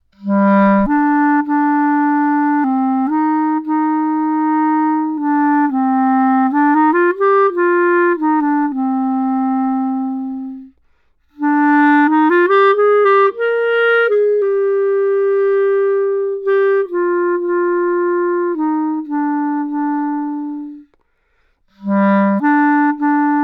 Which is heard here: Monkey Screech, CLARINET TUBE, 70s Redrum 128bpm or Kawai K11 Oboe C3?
CLARINET TUBE